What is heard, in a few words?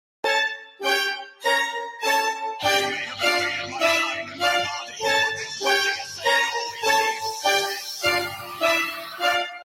drip song; funnee; Voicemod